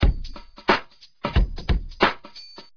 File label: rnb 90, drums